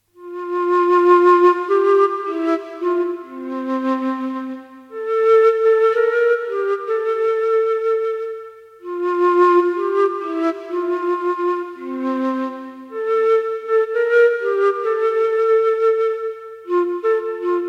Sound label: Wsrod nocnej ciszy flute; Koledy